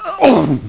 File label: DYING; BattleSNDS